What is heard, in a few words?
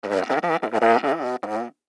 Mouthpiece Vocal, Mouthpiece Vocal Chatter Short; Cartoon; Musical; Blastwave